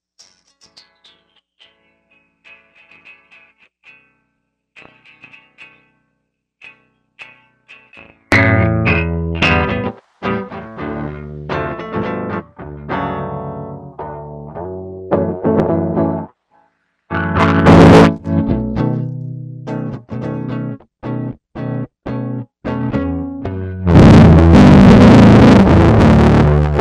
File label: Guitar distortion; Plague Bearer